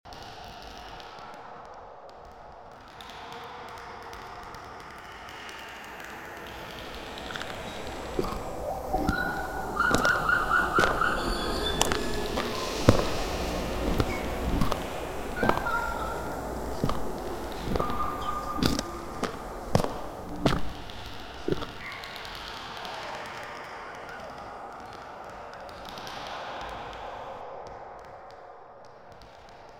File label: monster; mysterious creatures of the dark planet